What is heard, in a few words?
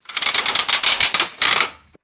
rickety metallic gear turning rapidly; mechanical